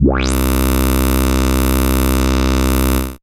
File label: Moog Bass, Dance Synths